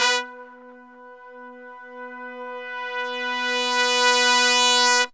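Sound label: brass sforzando; Zero-G; Phantom Horns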